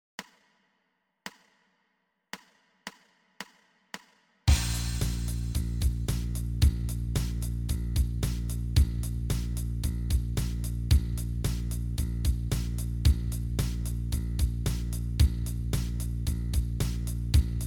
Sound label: bass startup bb 112